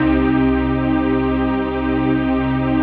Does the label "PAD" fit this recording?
Yes